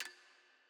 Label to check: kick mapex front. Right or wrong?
Wrong